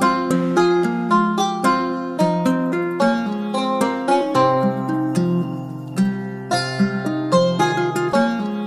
guitar crazed, Jazzmatazz loops

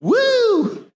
woo

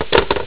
phone hang up

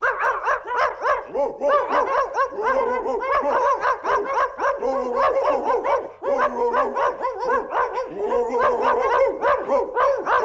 dog pack; Animals

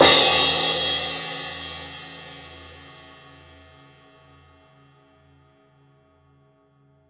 Cymbals

